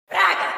echo; praga

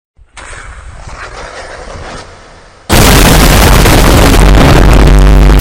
mega ultra deluxe vine boom

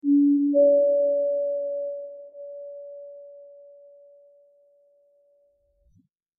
subtle double tap notif

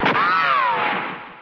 gunshot

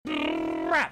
brrrap

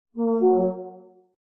horn

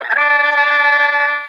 scream short

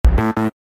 car driving fail sound; city car driving

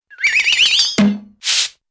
flexitone roll up doonk and scrape

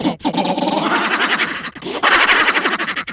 laugh; tayo exe

